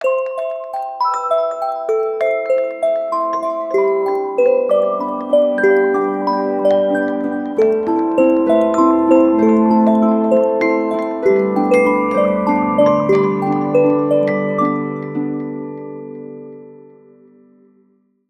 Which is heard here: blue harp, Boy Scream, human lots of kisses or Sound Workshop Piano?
blue harp